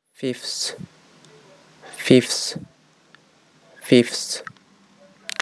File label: fifths